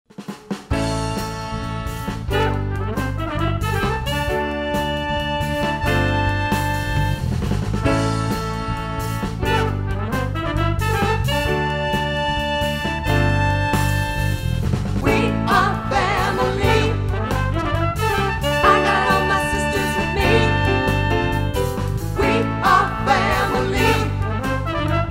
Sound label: vocal